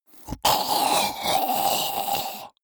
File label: monster choking zombie dying; comedy-cartoon; soundpool